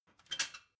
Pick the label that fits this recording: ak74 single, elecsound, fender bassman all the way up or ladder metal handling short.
ladder metal handling short